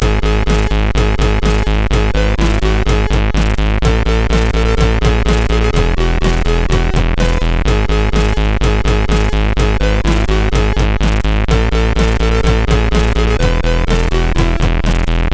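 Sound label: Xmas Rock, MOS8580R5 sid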